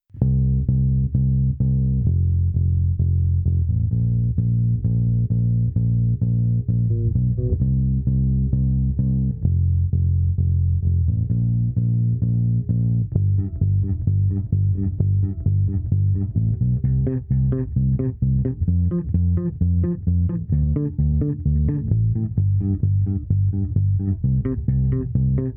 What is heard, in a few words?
Bass